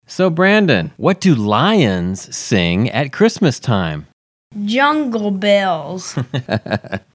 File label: jungle bells